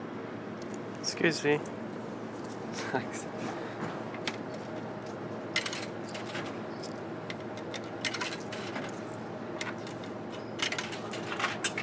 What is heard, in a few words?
vending machine